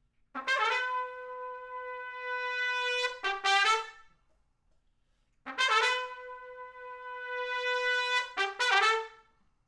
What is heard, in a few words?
trumpets